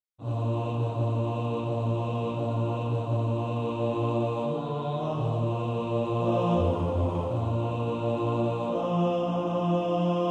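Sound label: bass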